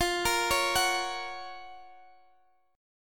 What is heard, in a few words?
Gb Major F; Listen to Gb/F arpeggiated